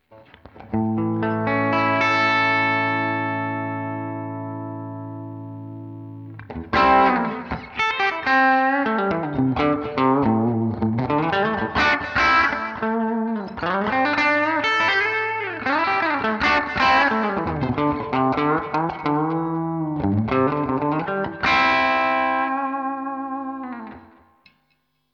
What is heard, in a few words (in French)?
TTop - son clair, micros montés en position bridge, Gibson; Comparatif de micros montés en position bridge sur ma Gibson The Paul; Gibson TTop VS Seymour SH4 Jeff Beck